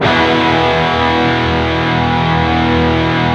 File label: PRS PC F 2, PRS PWR CHRD, Guitars